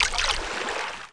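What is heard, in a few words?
fish jump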